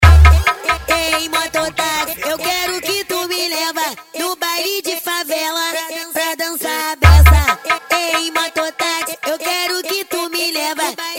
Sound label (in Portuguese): edicao automotivo; souza eletronica